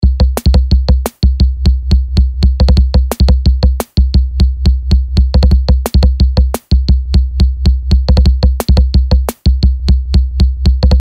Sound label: 175bpm